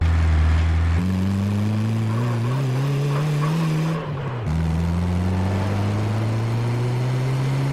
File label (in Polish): silnik van